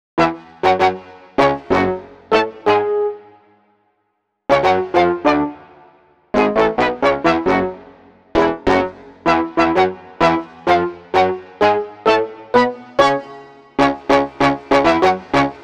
Sinthetik Horns; Cinematic Funk; Sonic Foundry (Sony Creative Software); Cinematic Funk Urban Tone Poems

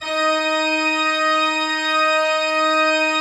D4, Cathedral Organ; ILIO; Propeller Islands Cathedral Organ

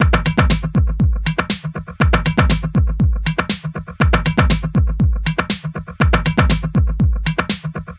Tabla Funk Beat; Sonic Foundry (Sony Creative Software); Myths of Technology; Composite Beats

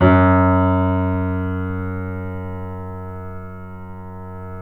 The Piano, F#; InVision Interactive - The Piano

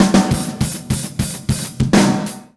intro, Street Beats; Hip-Hop Street Beats Construction Kit; Street Beats by Poogie Bell

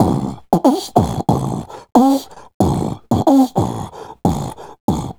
Beat Box, Hip-Hop and RnB Vocals

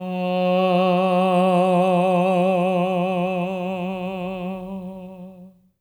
TENOR F#3; Spectrasonics; Symphony of Voices

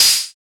PER PROCU, Procussion

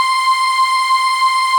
SYNVO; Greytsounds; Scott Peers Sound Engineering

